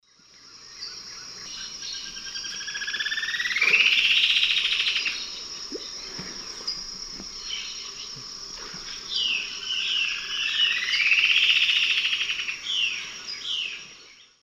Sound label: Xiphorhynchus obsoletus, song; S. America; Eastern Lowlands